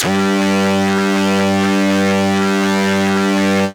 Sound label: Synth Multis G1